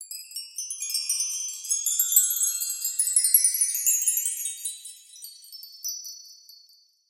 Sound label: chimes slow rise